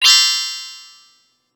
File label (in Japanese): キラリーン♪